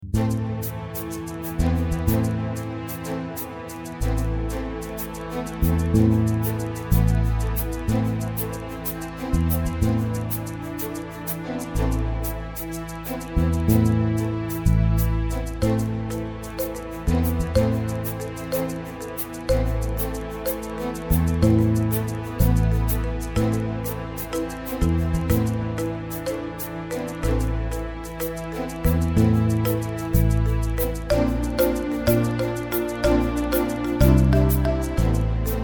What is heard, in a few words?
strings